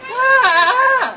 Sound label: goat; torchlight